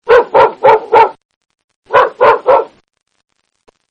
BARK, Dog; Animals; Partners In Rhyme

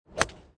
Sports Golf Club Swing Impact Ball

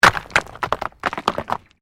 STONES MOVE

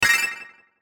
chime digital; Multimedia; Chimes; Blastwave

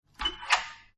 Door Hydraulic Open; Doors General; Miscellaneous Doors

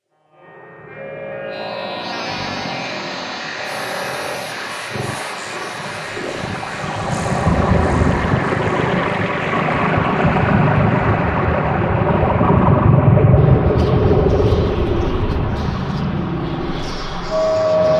Drone Alien Underworld; Drone Sound Effects; Andrew Fleming

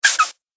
Bottle Air Squeak; Airy Sound Effects

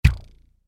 Bow Snap Thwap String Pluck; Bow And Arrow; Bows and Arrows; Combat; Warfare; Blastwave

